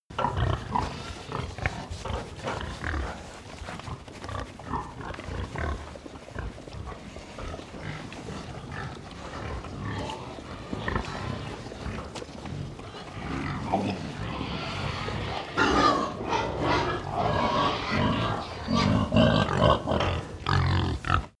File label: pig sty